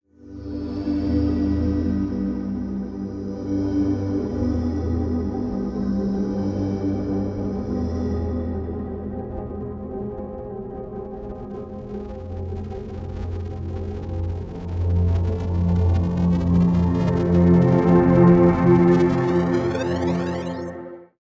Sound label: Granulator riser pad